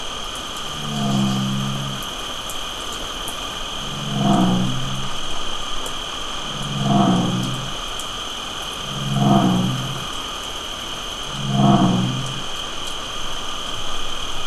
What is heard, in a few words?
bullfrog slow